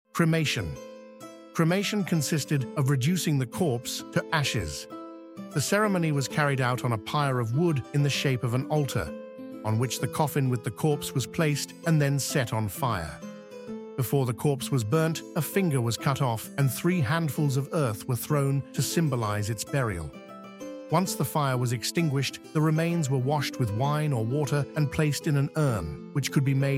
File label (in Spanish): música; archaeological museum audio guide